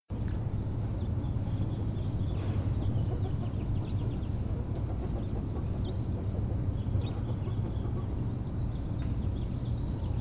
soundscape Chicken coop; Pitzer

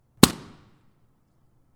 reverb courtyard sound

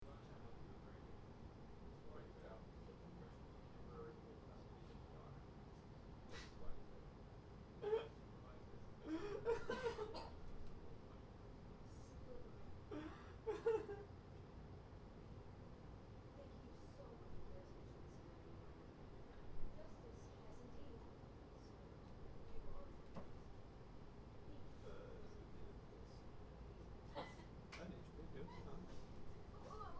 Room Balcony; Pomona; Harwood Court

